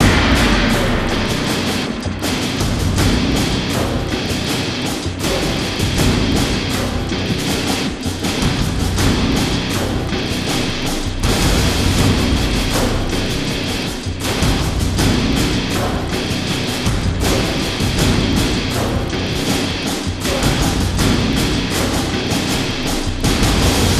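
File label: music, guitar and drums loop; transformers war for cybertron gamerip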